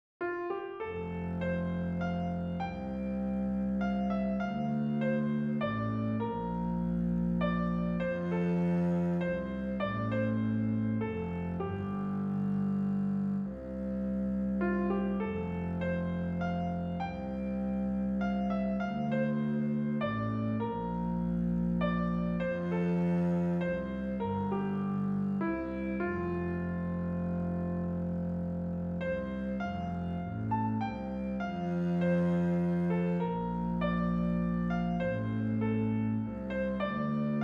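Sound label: MELODY with BOWED BASS; Papas Journey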